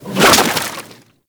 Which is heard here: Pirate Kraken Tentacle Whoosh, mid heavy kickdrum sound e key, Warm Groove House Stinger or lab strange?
Pirate Kraken Tentacle Whoosh